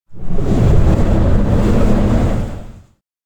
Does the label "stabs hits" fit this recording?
No